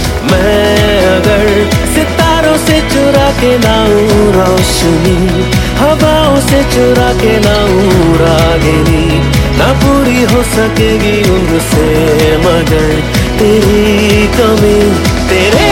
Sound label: Hindi Song